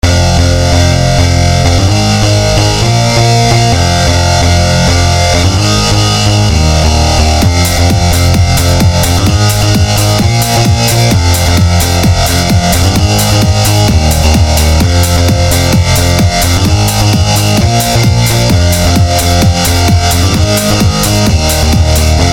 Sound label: nokia rock